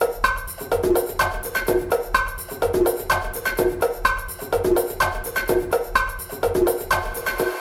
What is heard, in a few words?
Perc Loop Afro 126 Cm